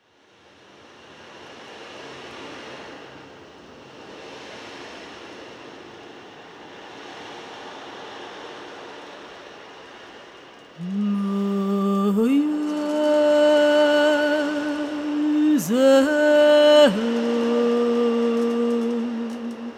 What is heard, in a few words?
oceandrum